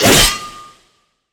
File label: attack horizontal; red velvet